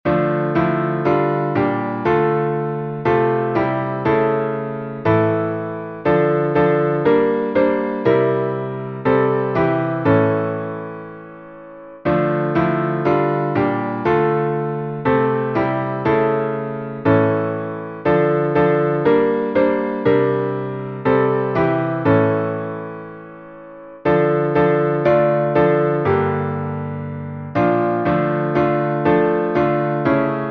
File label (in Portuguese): salmo playback